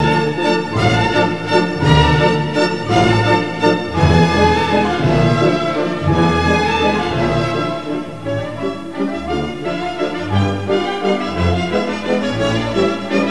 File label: vals